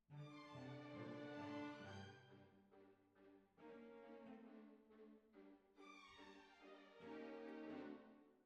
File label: Cbasses